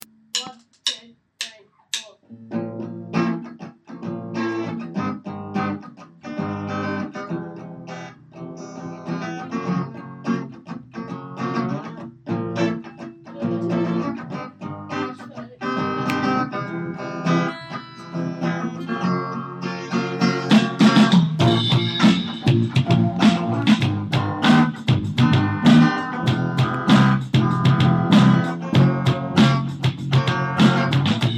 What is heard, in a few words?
syncopation piece